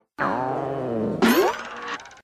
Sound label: Hungry Tummy; Anime